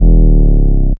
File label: Trap bass, EDM